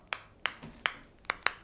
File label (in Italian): colpi di becco di civetta; aspetto terrifico